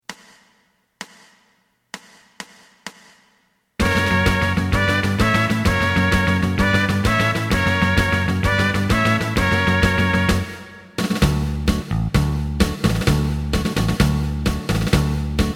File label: kanashiki guitar tango; リード(-1); エレキバンド用カラオケ